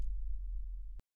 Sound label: Mus Village Build 120; from dust